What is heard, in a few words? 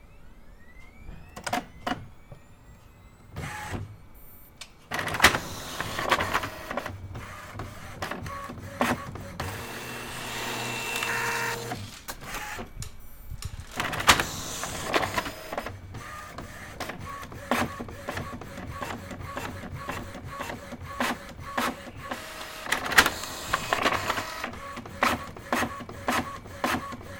Inkjet Printer Sound